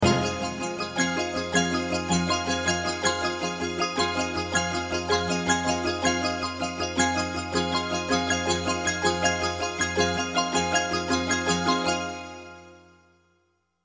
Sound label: Toy Piano